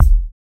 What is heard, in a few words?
kickdrum sample d sharp key; one shot